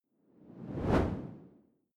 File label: whoosh simple low; swooshes-swishes